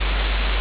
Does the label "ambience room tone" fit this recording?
Yes